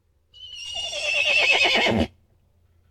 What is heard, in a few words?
pony moaning; petz